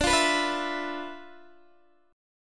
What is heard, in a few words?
D-Minor 6th Add 9th, D, Guitar, Open D